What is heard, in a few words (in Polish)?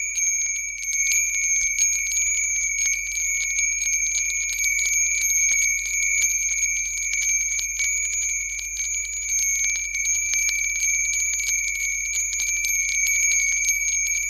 dzwonek, fengshui; dzwonki